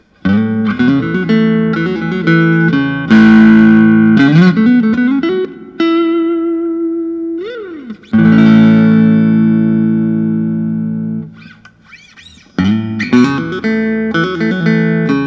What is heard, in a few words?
fender telecaster blonde transparent